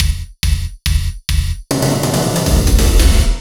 Electro Fills

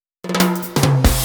Electro Fills; Electro Fills Packs

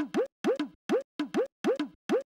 All BPM Style Dholki Beat

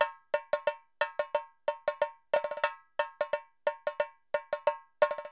Gabra Dholki Loops

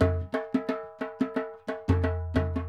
Gabra Dholki Loops

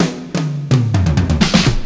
Electro Fills

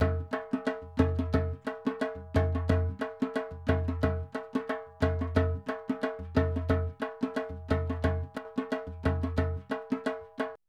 Dholki Loops

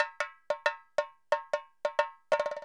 Dholki Loops

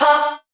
Vocal Shots

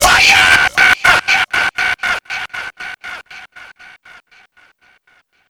Faayaaaa, Dj Sample

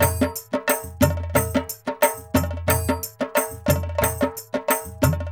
New Gabra Dholki Loops

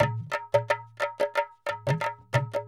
Gabra Dholki Loops

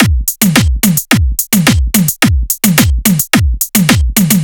Dholki Beat

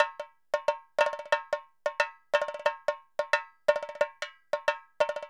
Gabra Dholki Loops

